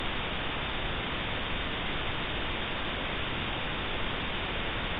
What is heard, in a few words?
thrust; space game